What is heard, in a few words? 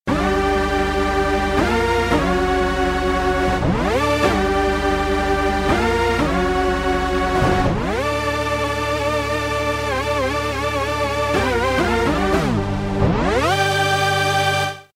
Dance Lead; Roland M-DC1